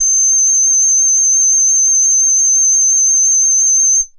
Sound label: Box Cello DX100 G8